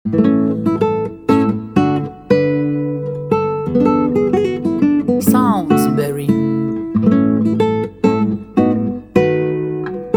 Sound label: Waltz